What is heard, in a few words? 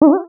comedy bubble wiggle